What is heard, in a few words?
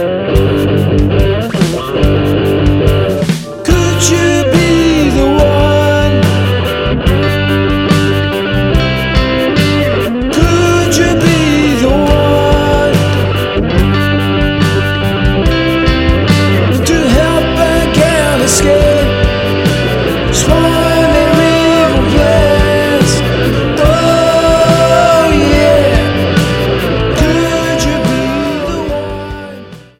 humans female